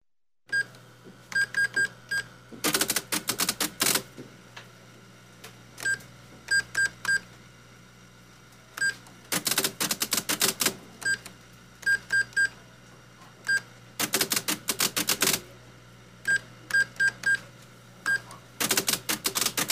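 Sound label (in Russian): кассовый аппарат электронный нажатие клавиш распечатка чека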